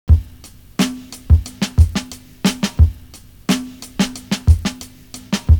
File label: kit, loop; Univers Sons - Kitch 70s